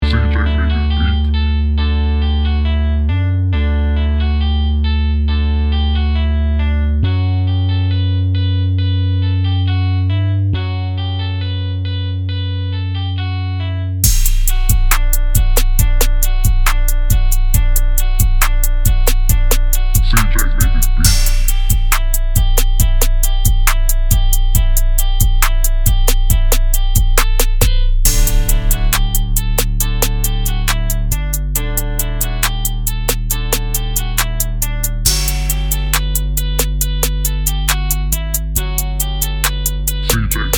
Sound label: Summer Beat